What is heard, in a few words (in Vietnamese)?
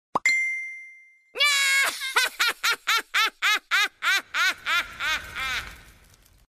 am thanh thong bao hai huoc; messenger lay loi